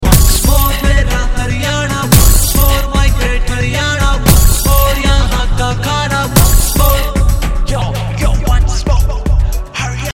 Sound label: Ring Tone